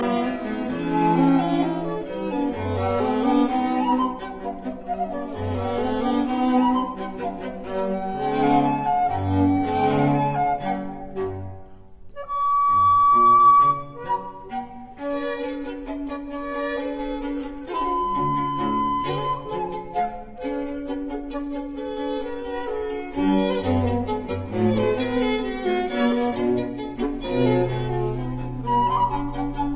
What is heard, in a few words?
Flute Quartet in G major Andante; K 285a